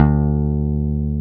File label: BAS D2; Best Service Gigapack 2